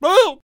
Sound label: Potionseller Attack Vocals